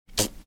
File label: making a weird sound